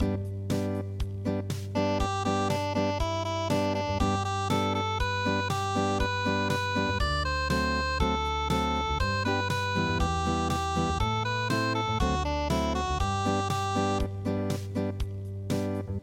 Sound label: unpluged